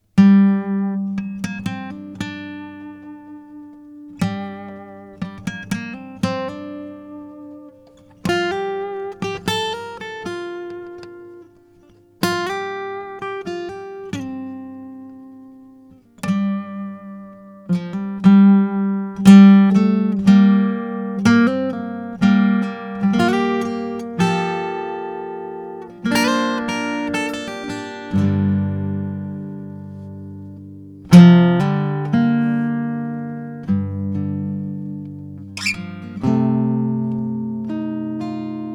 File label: Gm120 GTR impro